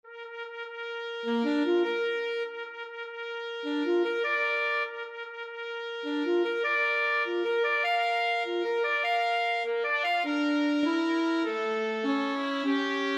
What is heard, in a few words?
trumpet saxophone duet; mendelssohn wedding march